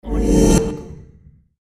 ui swipe

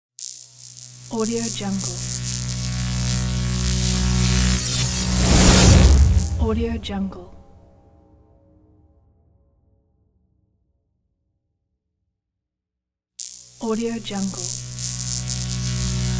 electricity

